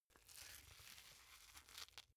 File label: boxing gloves close velcro long; sport freizeit; boxen